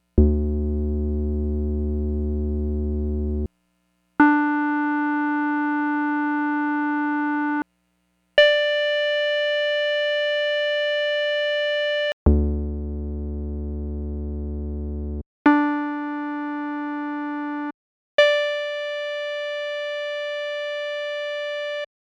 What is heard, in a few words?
Organ; Compare CS80; Xils